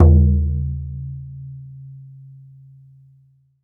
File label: BODHRAN, Celtic Percussion